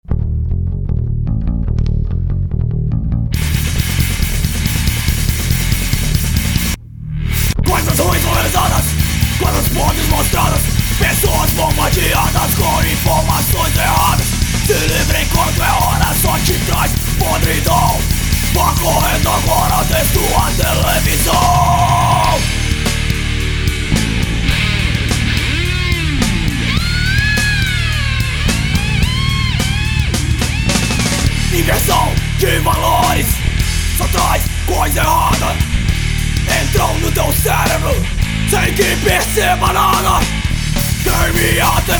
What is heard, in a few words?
DISTORTION